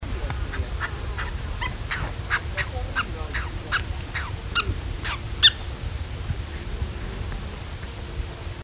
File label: white fronted woodpecker